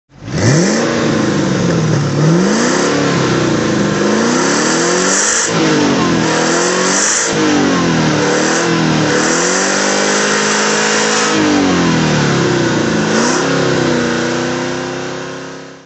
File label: mg sv-r throttle